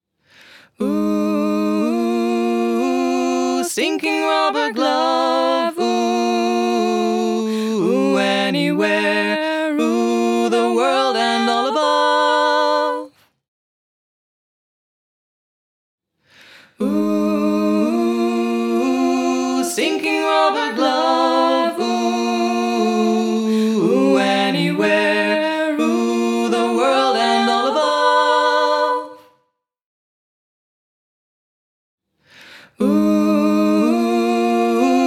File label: Reverb; 112dB Redline Reverb - Zucchini Sisters II